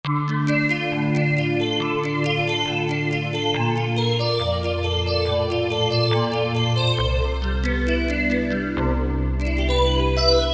Korg T3 Wavesweep

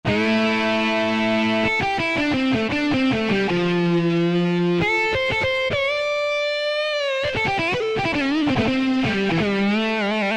Power Lead, Yamaha PSR-3000 guitar; Factory Voices; Guitar & Bass

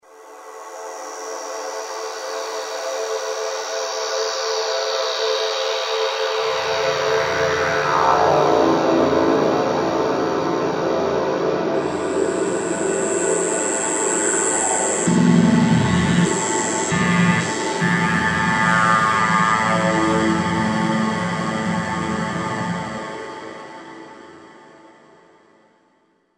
Metal Dream, Roland SR-JV80-11 Techno Collection

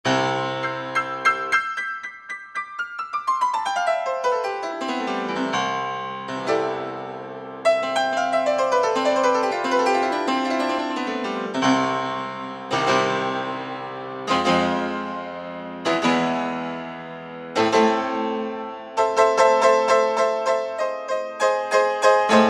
Bosendorfer Grand; Optical Media International Sonic Images Library

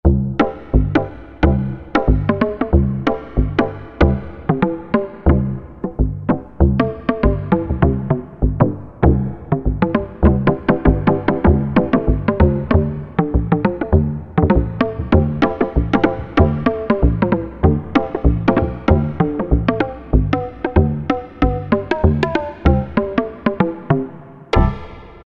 Perc Wave, Korg T3

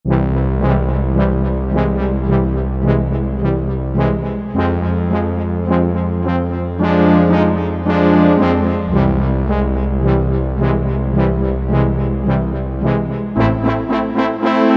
Stero Bras, Korg MSC-1S; Korg MSC-1S Variety

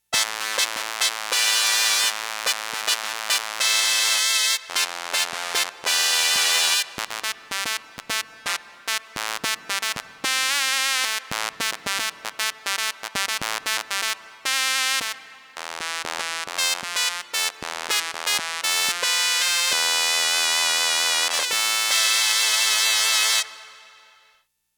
SAWTOOTH, Roland JX-1; Factory presets

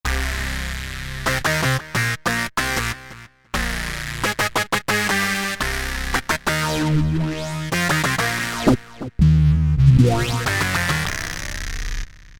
Comp, Alesis Micron; Factory Programs; Dude!

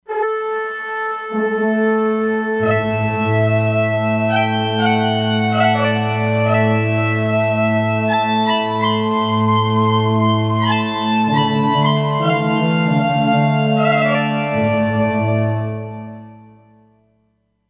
Hybrid Winds, E-mu Proteus-3; Factory patches

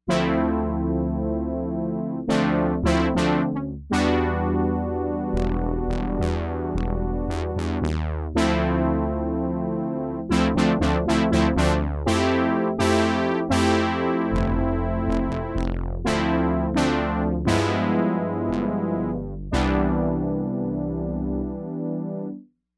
Synth Brass; synthesizer; Guess the synthesizer game

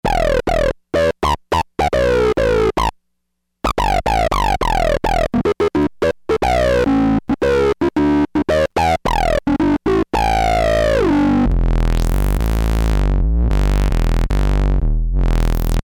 Throaty, Novation XioSynth; Novation XioSynth 25

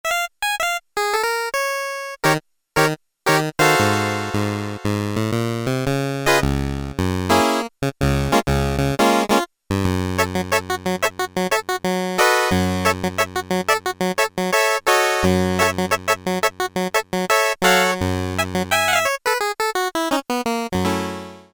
Harpsichord, Korg Poly-61M

